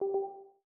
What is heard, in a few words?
Windows Hardware Fail; Windows 11; Dark